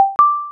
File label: notif